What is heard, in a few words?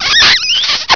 smuuch, Kiss Wave!